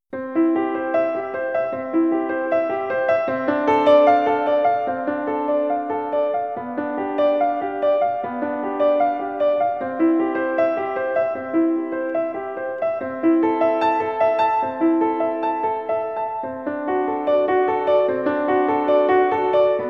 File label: c major prelude